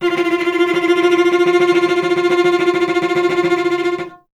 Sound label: Cello F; Miroslav Vitous; Solo Instruments